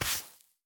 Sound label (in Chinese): 刷子刷沙砾声